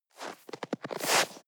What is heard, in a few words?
step snow scuff; schritte